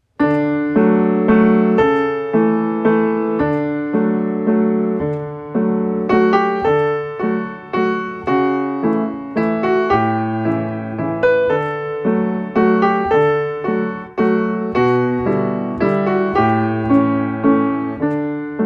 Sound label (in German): Klavier; Kommt ein Vogel geflogen